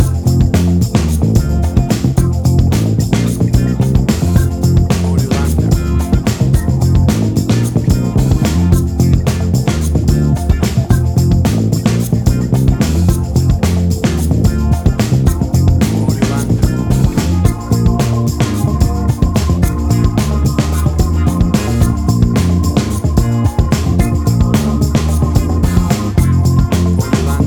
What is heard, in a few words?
Acid Jazz Fusion